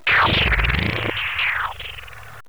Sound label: stun